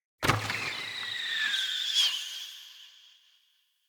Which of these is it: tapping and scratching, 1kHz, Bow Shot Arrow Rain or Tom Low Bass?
Bow Shot Arrow Rain